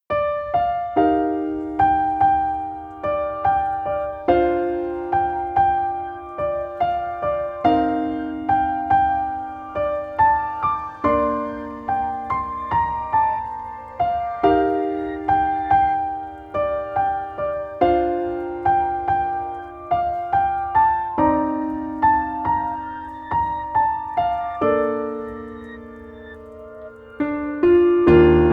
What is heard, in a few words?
Piano Electro; 例大祭17